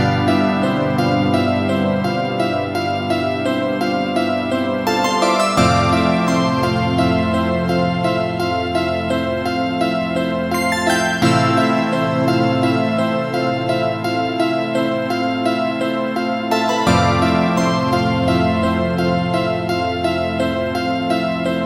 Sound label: acoustic soft